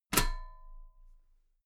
metal ladder step heavy

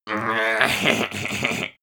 goblin laugh evil

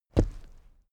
step wood step

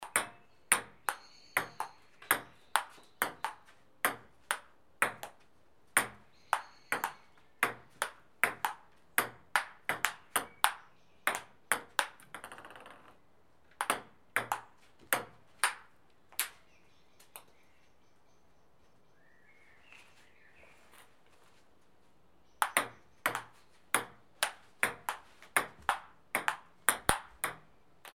ping pong match

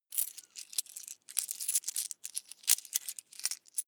coins clatter soft long

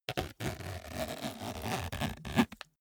zipper small case close slow

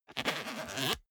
zipper small case open

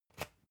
usb port metallic out

